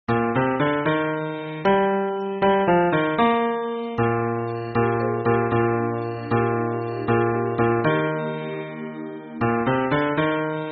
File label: hymn bass